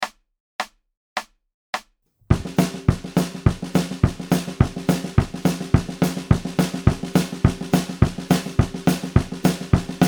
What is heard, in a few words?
Country Train Beat; Daniel Glass